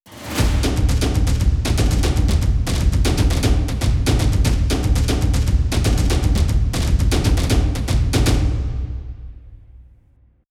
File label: War drum loop BPM 236; M8; percussion and foley